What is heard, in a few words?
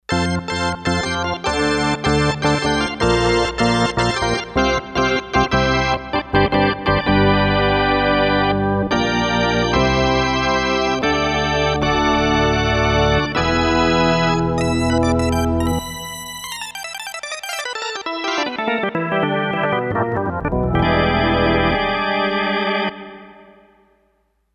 Rotor Organ; Factory Patches